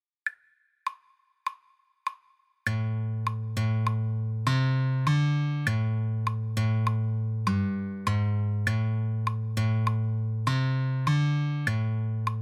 Spy Riff

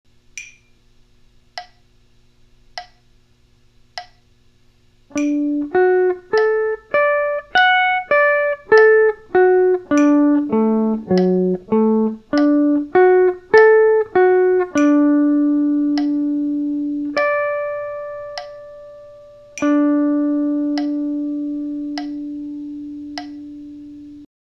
caged d form arp

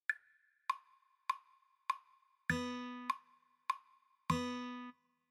rhythm intro, guitar

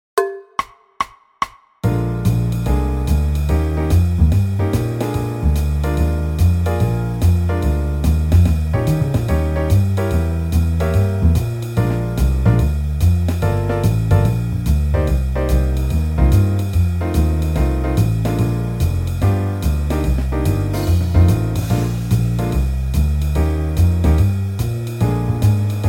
Blues Jazz fast, guitar

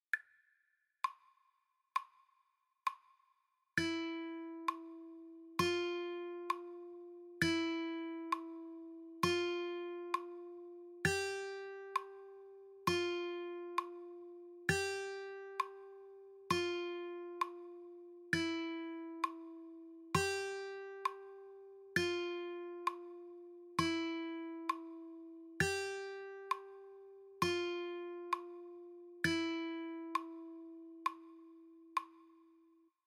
E F G Practice, guitar

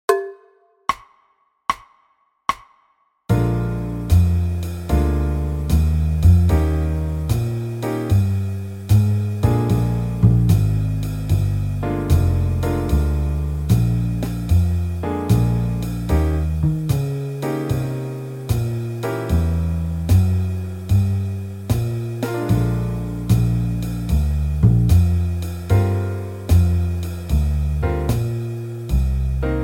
Blues Jazz slow, guitar

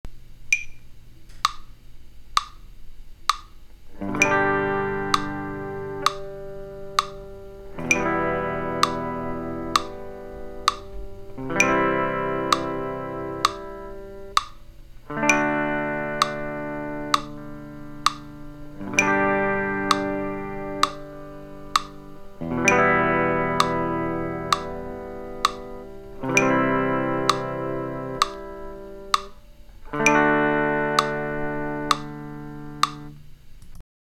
Chord switching whole notes

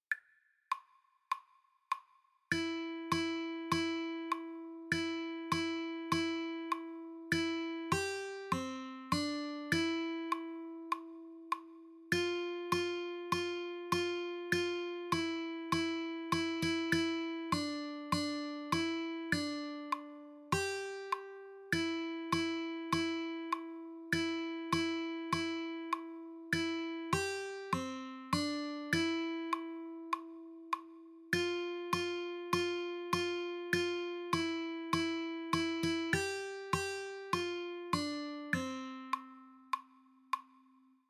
Jingle Bells fast, guitar

